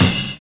CRASHING; Univers Sons

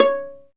STR VLA PI, Chamber Pizz; Roland L-CD702

